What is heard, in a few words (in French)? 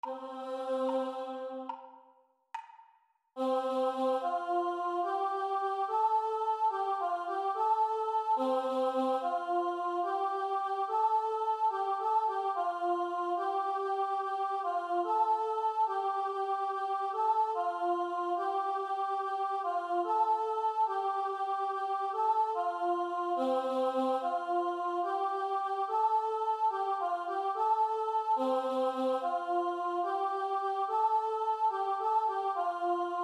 Chant